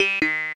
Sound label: wrong answer; inlogic-zootrivia